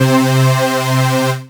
hypersynth lead

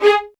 G#4, LOUD VIOLINS; Q Up Arts - Denny Jaeger Master Violin Library

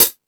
High Hat, Drum Machines E-MU Pro-Cussion

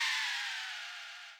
crash, Millenium MPS-400

